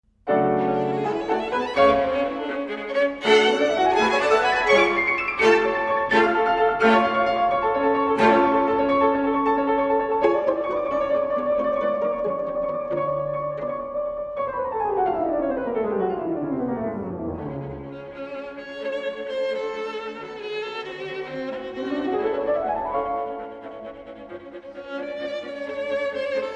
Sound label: Quintet for Piano and Strings in F-sharp Minor, third movement; Amy Cheney Beach; Op67